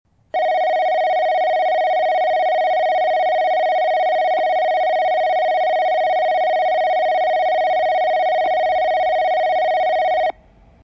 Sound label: yoshizuka bell